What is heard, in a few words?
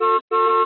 KLAXON